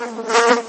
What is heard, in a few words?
insects single short fly; zvukonasliduvannya